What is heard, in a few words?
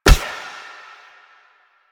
Choir Bow Quick Fire; Warframe; Weapons